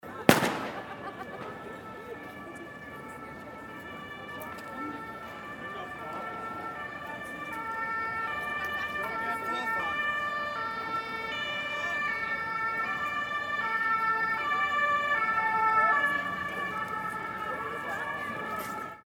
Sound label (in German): Silvester Martinshorn